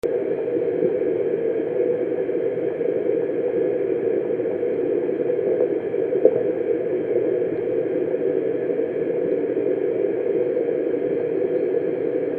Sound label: St George terrace Hydrant main good